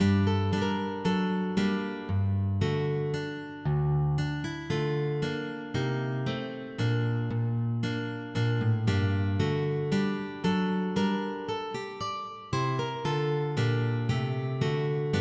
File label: MIDI; Poore Tome